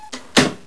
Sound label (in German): Tuer zu; max1demo